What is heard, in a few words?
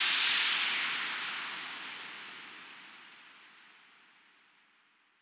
Sweep Down; SFX; Missterious